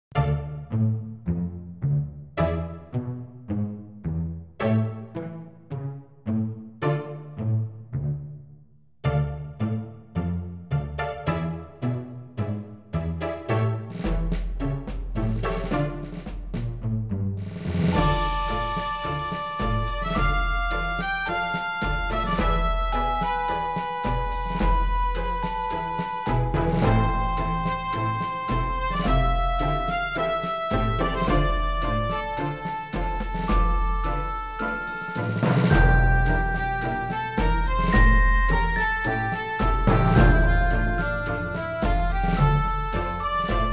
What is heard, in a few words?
Tango